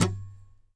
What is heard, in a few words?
SCR, guitars; Spectrasonics; Hans Zimmer Guitars VOL-2; FNGSTYLE PFM